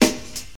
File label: small reverb snare drum sound c key